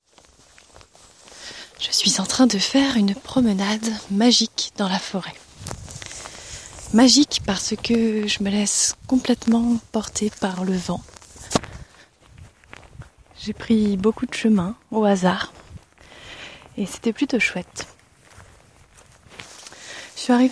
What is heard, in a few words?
vocal